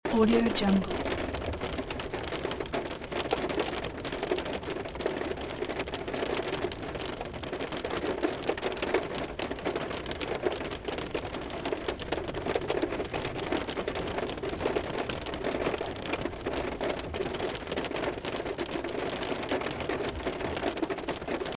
rain on car under trees

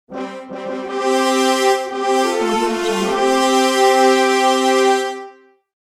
royal entrance brasses

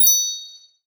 slot machine ding

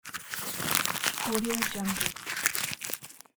crumpling up paper

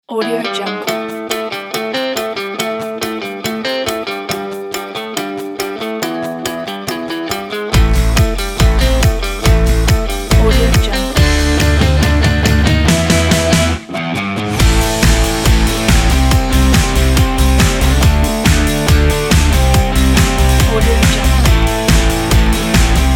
upbeat rock

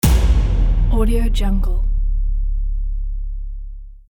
impact; twinkle m

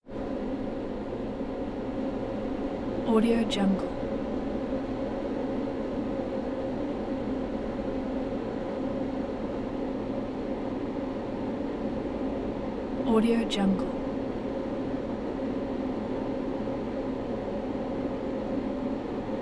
vending machine refrigerator motor hum

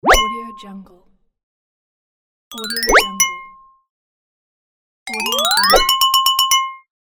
playful prize bell; floraphonic

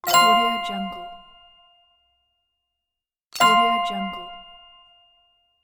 correct answer

